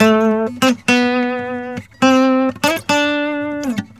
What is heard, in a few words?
GUITAR SALSA